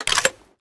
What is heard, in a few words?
203 insert, 40mm; gmod; arccw uc commons attachments